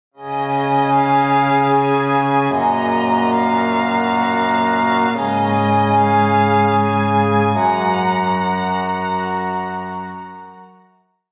Industrial Calm; Civilization Revolution; Xbox

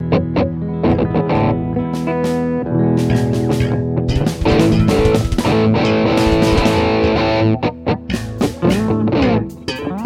Rock BGM; Club Rock BGM; brooktown high; playstation portable psp

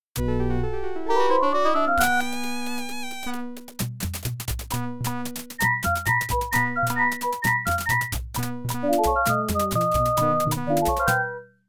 Gameover

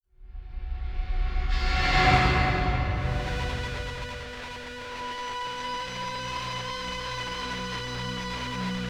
PreIntro 135bpm; battlefield hardline; ps3 ps4 windows xbox 360 xbox one

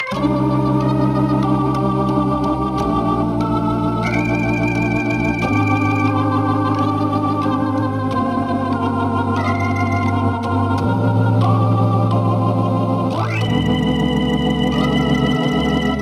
Organ; Flower, Sun and Rain; PS2

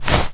walk foley

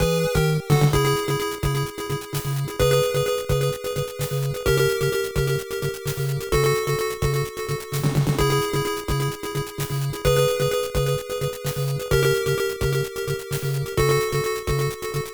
Stage Start, NES; Stage 7 Start

